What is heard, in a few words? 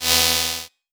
Synth, 8-Bit; 8-Bit Kit Volume1